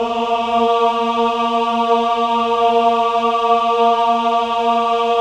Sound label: MEN AH, Choir of Ahs; Roland - LA Composer vol. 1